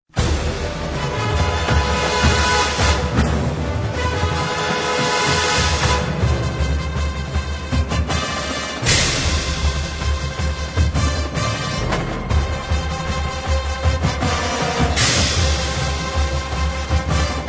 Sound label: Fanfare; Northwestern State